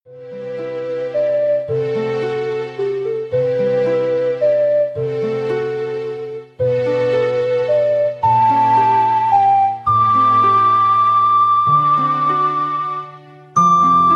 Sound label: lullaby ringtone; zelda